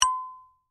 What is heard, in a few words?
Chime Bell Muted, Music, Chime, Bell, Muted; Bells; Musical; Blast Wave